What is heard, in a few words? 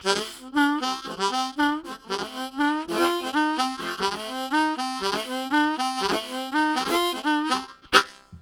Low Chat, Harmonica Riffs; Zero G